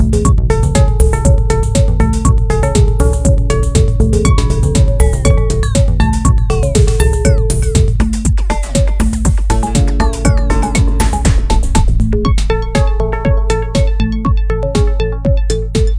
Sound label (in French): musique